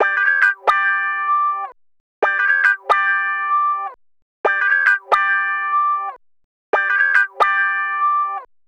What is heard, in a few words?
Guitar Wah; NGM WeMove Miracle; ringtones